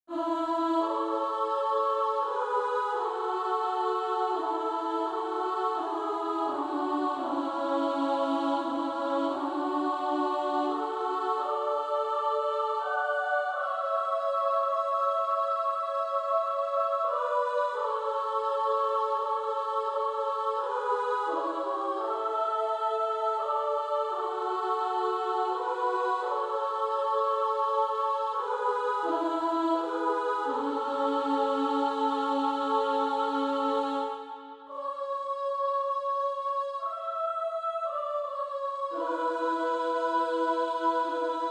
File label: Female Choir SA; Christ Liveth in Me